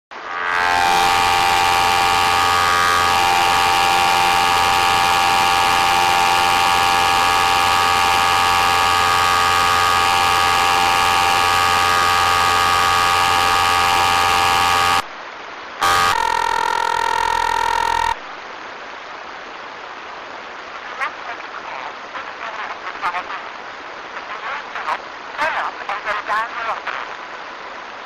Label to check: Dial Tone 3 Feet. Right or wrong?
Right